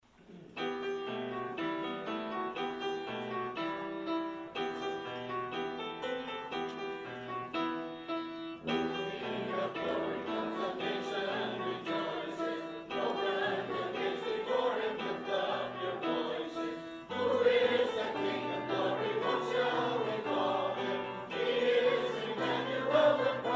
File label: w Brass & Piano